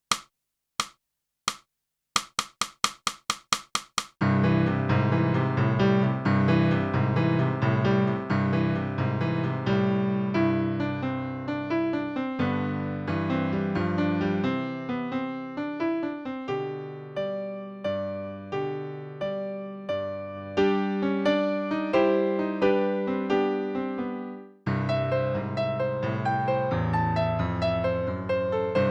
clarinet; (Morgan) - Boosey Woodwind Method: Clarinet (Book 2)

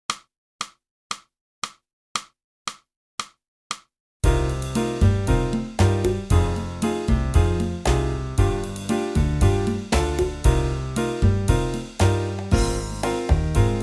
sax

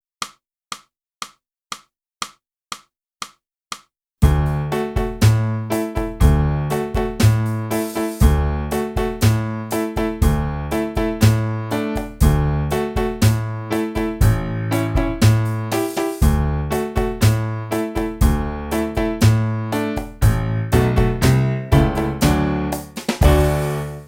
flute; Boosey Woodwind Method: Flute (Book 1)

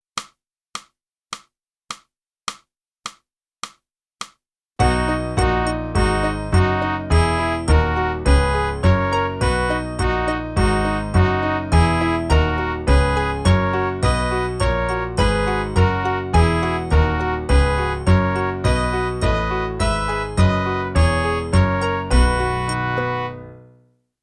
flute; Boosey Woodwind Method: Flute (Book 1)

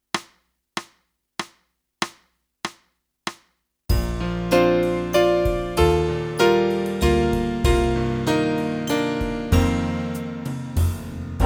sax

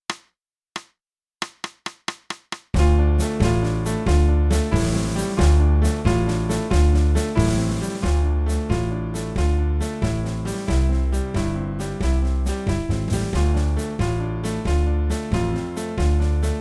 flute

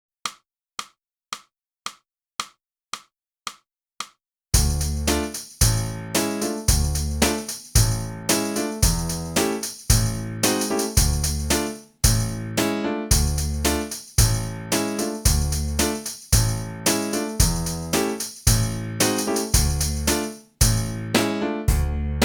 flute

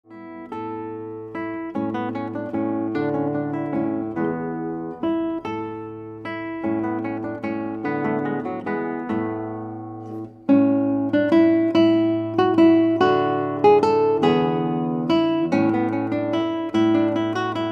Guitar

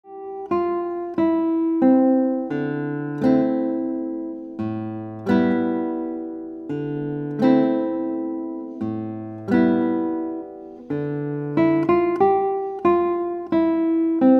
Guitar

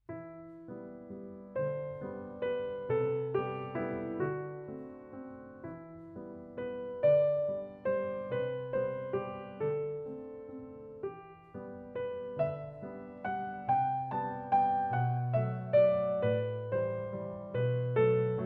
piano

